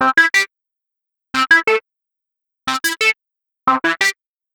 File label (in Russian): Dry Sharp, сэмпл синтезатора хип хоп